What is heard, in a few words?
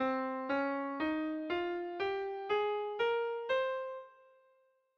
Minor Phrygian